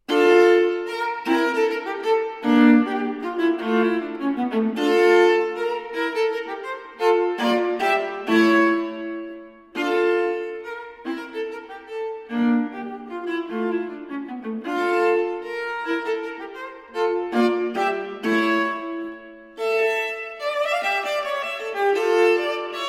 Cello Suite No. 2 in D Minor, for Viola, Menuets I & II; J.S. Bach Cello Suite No. 2 in D Minor, BWV 1008 (Transcr. for Viola) V. Menuets I & II